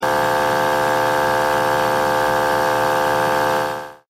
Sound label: Air Pump